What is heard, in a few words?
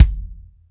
BD, Bassdrums, Roland TD-20